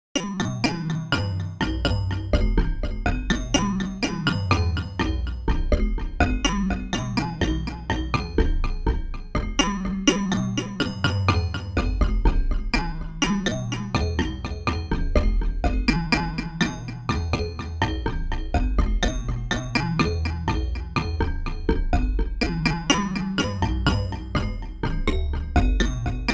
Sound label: Distorted Japan FX arp